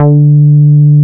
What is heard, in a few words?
JUNO E-BS D4; Drums In Your FACE!